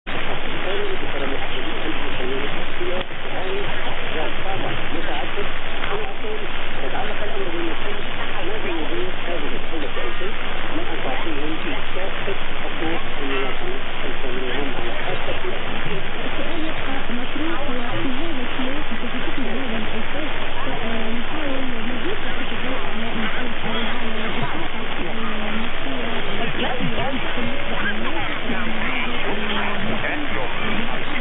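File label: Saudi Arabia, radio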